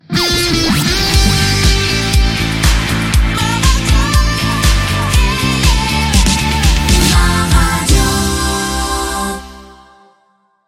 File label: ROCK